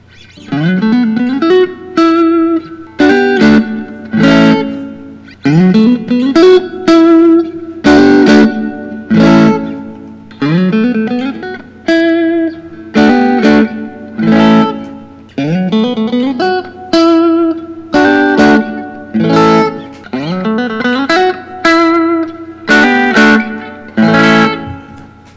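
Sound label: fender custom shop 60s nos stratocaster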